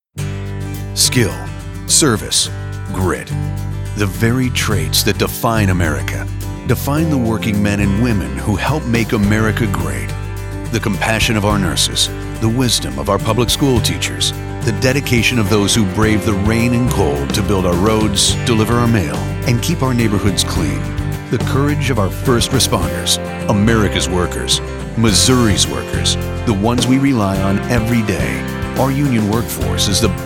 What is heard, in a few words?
Music